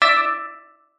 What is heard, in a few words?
emoji warning